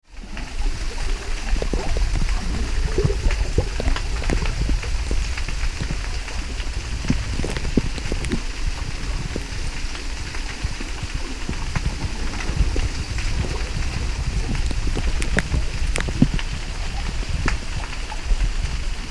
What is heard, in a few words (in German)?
aaresteine reise marzili